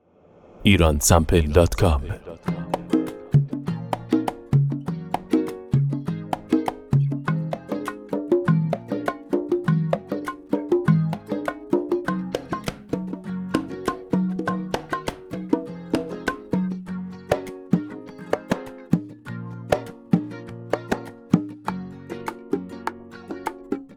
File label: conga bandari; conga bandari loops